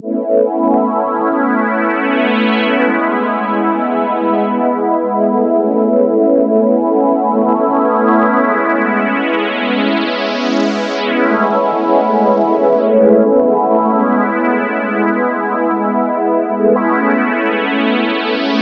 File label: Dull Pad, electro lounge; Sonic Foundry (Sony Creative Software); 086 Sister Strut